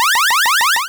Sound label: recover; breakout